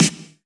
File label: Claps & Snares